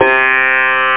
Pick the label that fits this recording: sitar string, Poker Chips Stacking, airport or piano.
sitar string